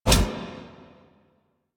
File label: Scan Impact Pre; Warframe; Warframes; Frumentarius